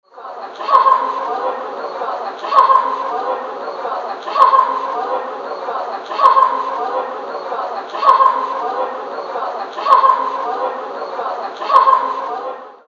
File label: Hahahaha